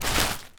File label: Forest w Twigs